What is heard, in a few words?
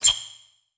Multimedia Internet CD-Rom Flash Pop Up Short